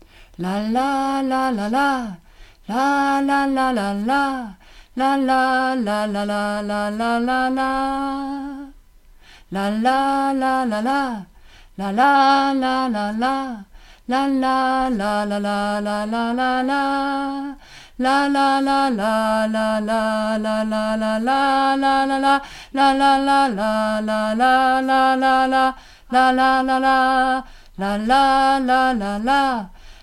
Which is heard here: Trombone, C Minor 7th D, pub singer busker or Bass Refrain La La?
Bass Refrain La La